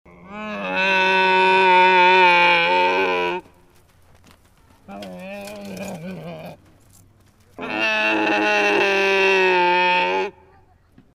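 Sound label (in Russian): звуковой эффект верблюда на ближнем востоке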